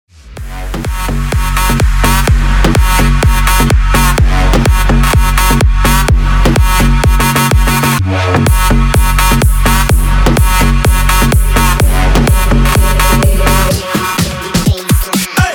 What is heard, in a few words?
dirty bassline